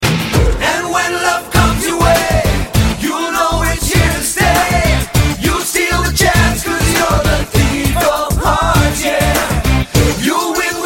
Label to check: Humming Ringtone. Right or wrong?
Right